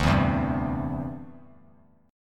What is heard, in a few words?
D 7th Suspended 2nd Suspended 4th D, Bass Standard; D7sus2sus4 strummed